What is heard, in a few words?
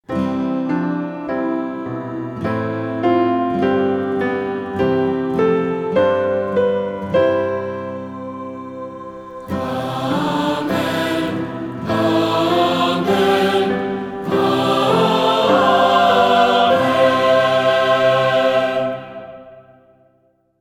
Amen; Heritage Mass